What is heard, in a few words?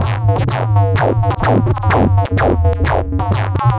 Minimal Funk, 127 BPM, Percussion; Sonic Foundry (Sony Creative Software); Underground UK House & Electro